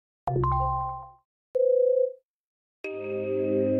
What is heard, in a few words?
Notification Ringtone